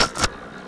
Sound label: Roland f30 drums